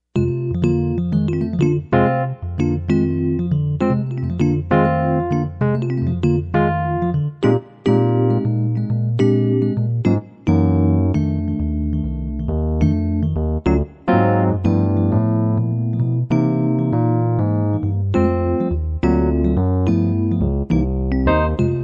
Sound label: Jazz Blues